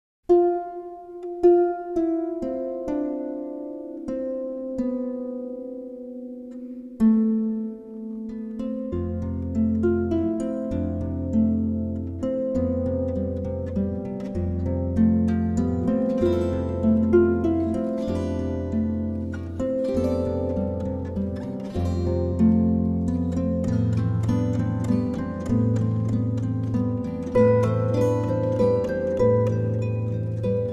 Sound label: Waltz